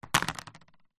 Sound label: household remote control tv plastic set down table; zapsplat